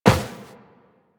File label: Run Metal, Footsteps; Warframe; Tenno